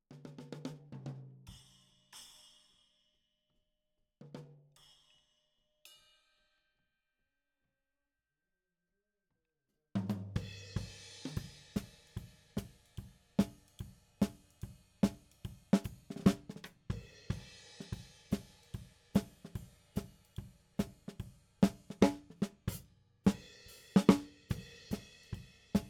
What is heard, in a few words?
SNR top